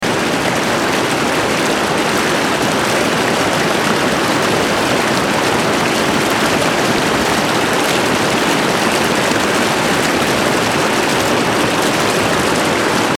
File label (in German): Wasserfall; FAUST Soundtrack